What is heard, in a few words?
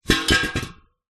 industrial gas cannister metal down on concrete